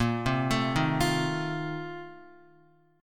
A sharp Major 7th Suspended 2nd Suspended 4th A sharp Guitar; A#M7sus2sus4; arpeggiated; JGuitar